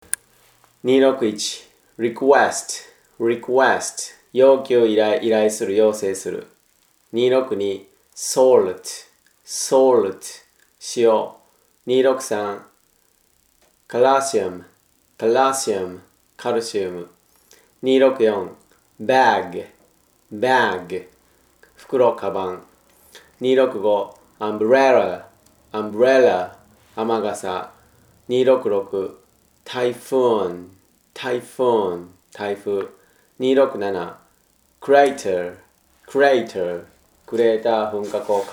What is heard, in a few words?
tango